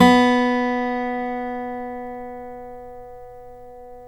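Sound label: GTR B4 AC; Synclavier Sampler Library; KEYS AND GUITARS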